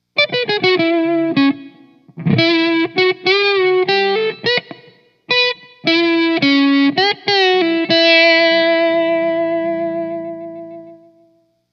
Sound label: blues